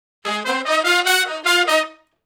HORN CRAFT

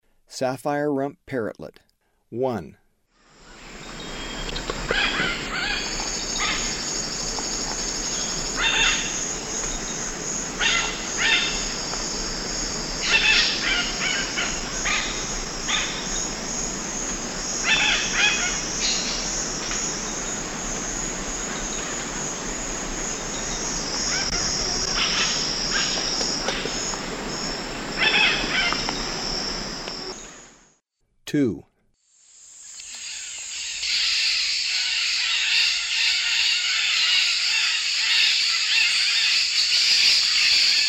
Sapphire-rumped Parrotlet; S. America; Eastern Lowlands

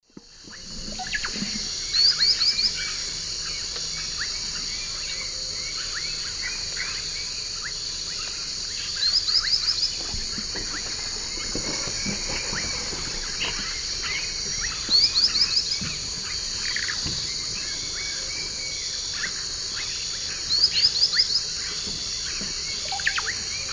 Ornithion inerme, song; S. America; Eastern Lowlands

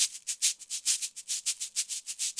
cabassa 100 bpm, latin; Sound Effect Library

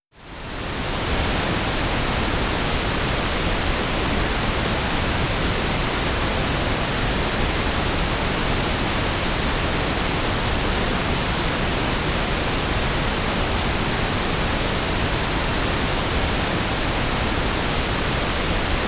Correlated Pink Noise; Legacy Audio Sampler II

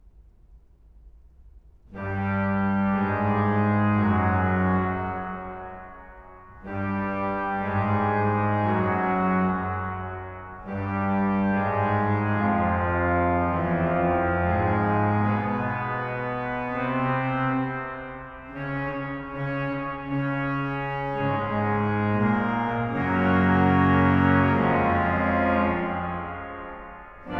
Requiem for organ Dies irae; Franz Liszt; Complete organ works

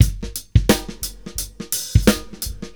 Drums, Rock

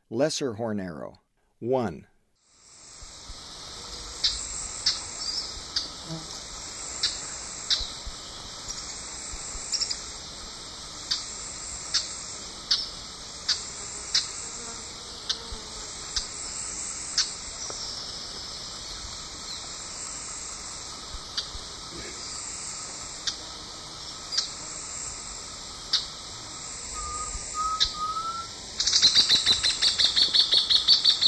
Lesser Hornero; S. America; Eastern Lowlands

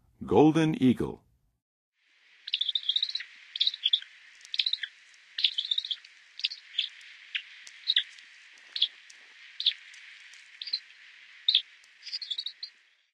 Golden Eagle, Birds of North America; Western USA; Western USA Songbirds

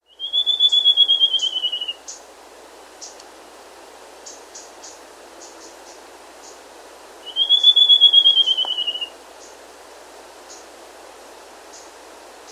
Slate-Crowned Antpitta; S. America

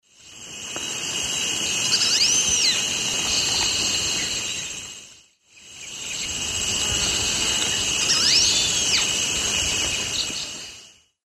Pachyramphus minor, calls; S. America; Eastern Lowlands

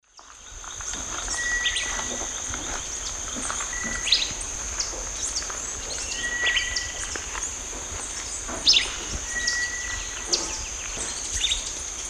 Vireo flavoviridis, song; S. America; Eastern Lowlands

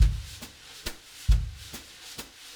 Drums, Jazz

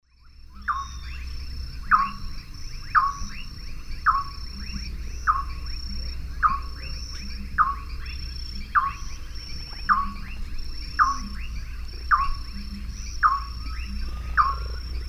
Nyctiprogne leucopyga, song; S. America; Eastern Lowlands

